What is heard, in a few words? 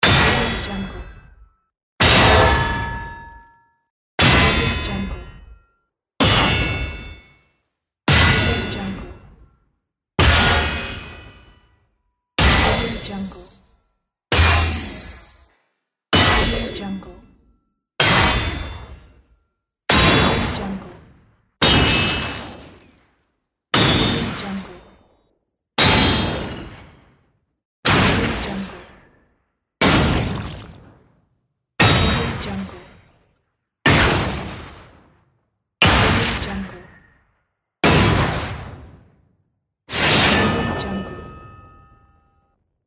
fire shield defense